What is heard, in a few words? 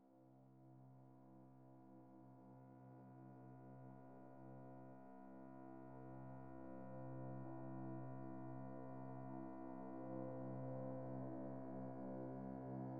Resonant Ambience